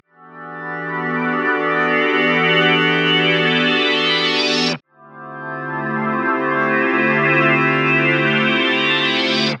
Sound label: 100 E synth lazer drone; Zero-G; Future Wave